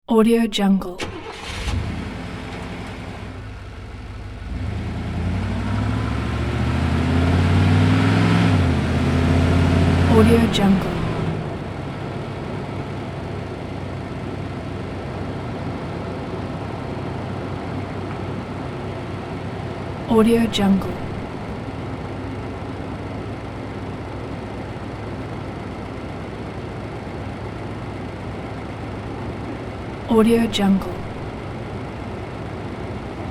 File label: chevy caprice slow ride with start; sound ideas